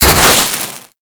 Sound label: Heat Gun Hit; Warframe; Grineer